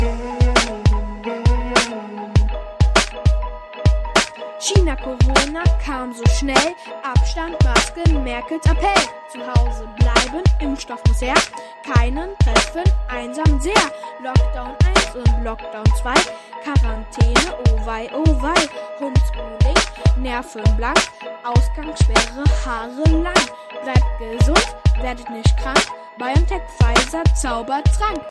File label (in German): Corona Rap